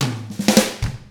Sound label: POP ROCK, Drums; ILIO; Double Platinum Drums 1